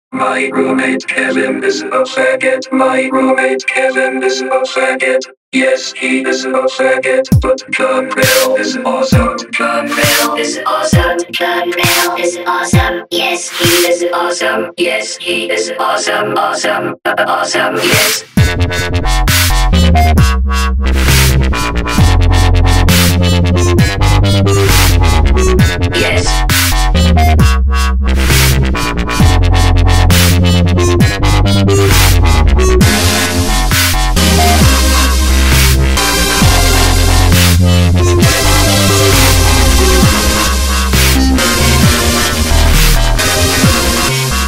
Awesome DUBSTEP

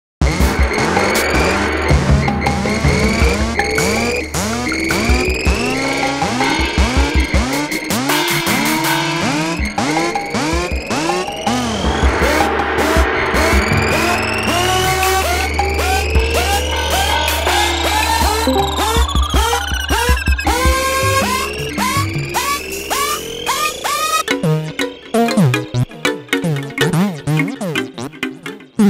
devious dark evil beat

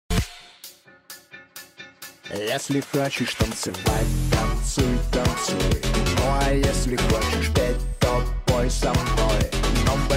CLUBSTEP

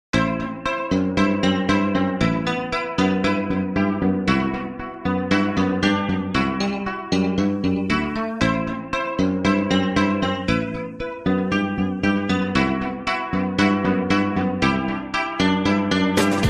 Instrumental

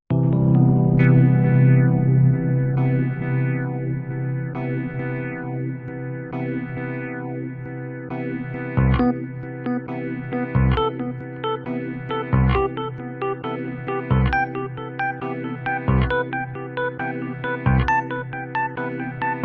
smoothie melody

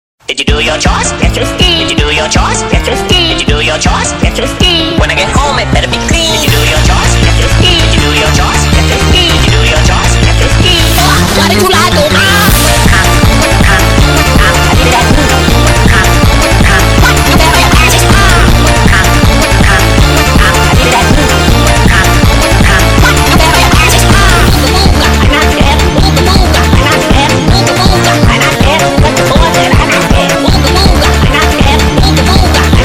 funk

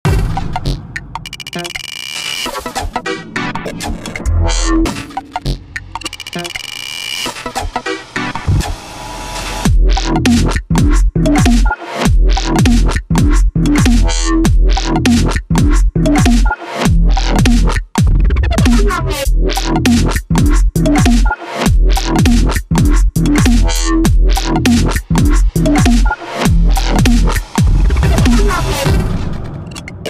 Dubstep

